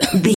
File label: voice female cough short